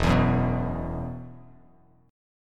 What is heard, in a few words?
E Suspended 2nd E, down, Bass Standard